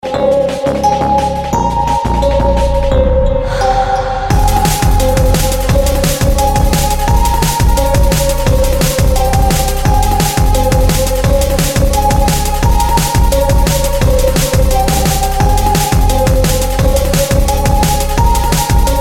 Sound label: soul drum